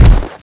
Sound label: rush loop; Frozen Terror